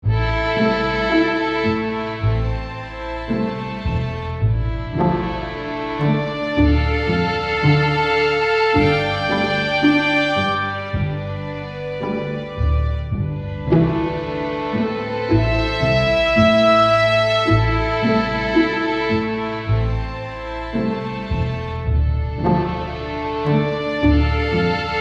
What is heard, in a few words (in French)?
Lent et triste